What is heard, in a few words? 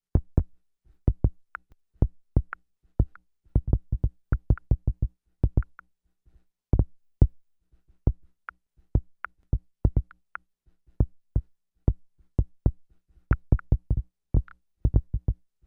random clicks